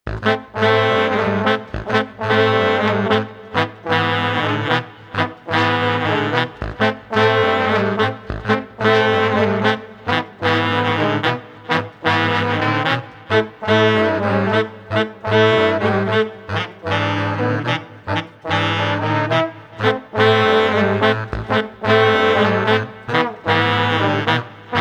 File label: Brass Swung Funky no Drums no Solo 110